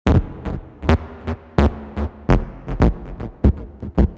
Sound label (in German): Klingelton, modular synthesizer; Superbooth22